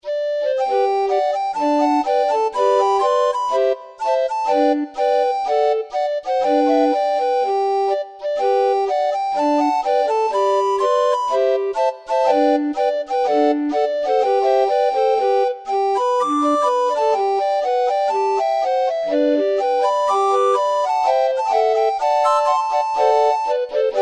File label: Grandfather's clock, orgue barbarie